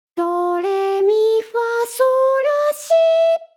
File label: doremi in F